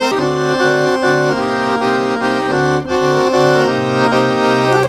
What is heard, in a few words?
Balkanish Traditions; USB Soundscan vol.63; AKAI